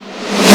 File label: REVRS SN; AKAI S-Series CD-ROM Sound Library VOL-1